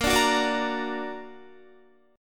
Bb Augmented Bb down Guitar; strummed; 4F,4A,5C,5G,5C,6E

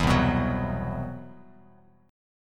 C Major Sharp 11th, Bass Standard; CM#11 strummed; JGuitar

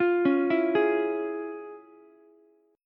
F Minor Major 7th Sharp 5th Ukulele; FmM7#5 arpeggiated; JGuitar

